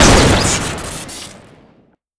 Drumshot Fire Crit, weapons; tf2classic

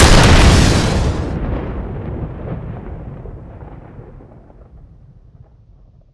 bigger stick shoot, weapons; tf2classic

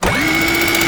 sentry heavy spin up; tf2classic

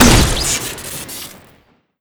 conch in a lake shoot crit, weapons; tf2classic

